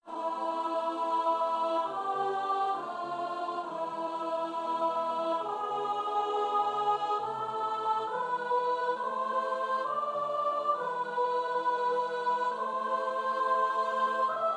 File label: Psalm soprano